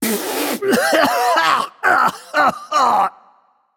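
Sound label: nonverbal clear throat; voicepack; smite; merlin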